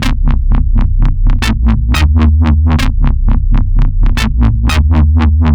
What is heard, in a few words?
Wowwy Bass, Drum n Bass; KJ Sawka; Drum n Bass & Beyond